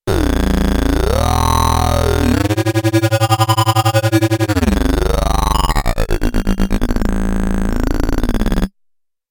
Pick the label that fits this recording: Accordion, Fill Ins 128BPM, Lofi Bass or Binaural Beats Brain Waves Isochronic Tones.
Lofi Bass